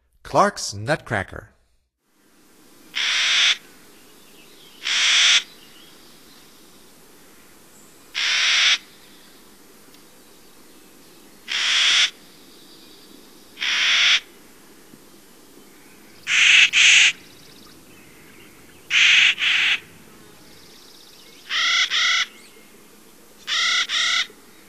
Clarks Nutcracker, Songs of Birds of North America; Stokes